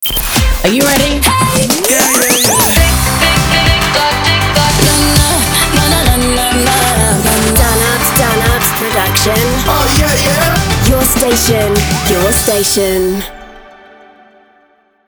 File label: Jingle